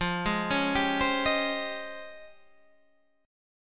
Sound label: Db Major Sharp 11th F